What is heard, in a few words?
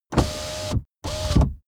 car window back close unsteady